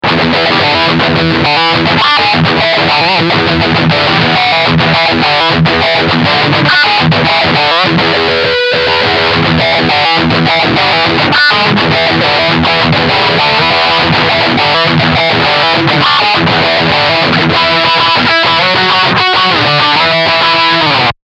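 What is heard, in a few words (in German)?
Steghumbucker Riff, guitar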